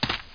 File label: gravel run; heretic ii demo; player